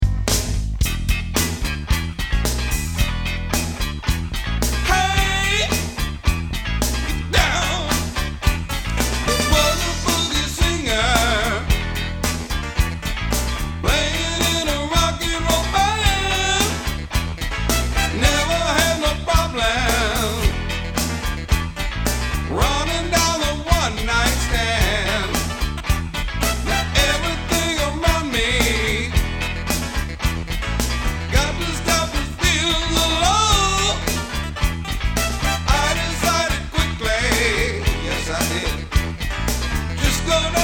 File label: funky music